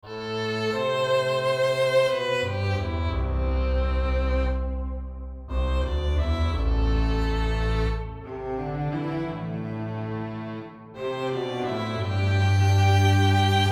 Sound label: Andante For String Orchestra in A minor